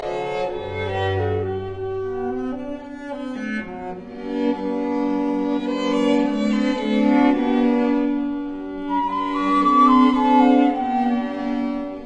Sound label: Flute Quartet Allegro; No 3 in C major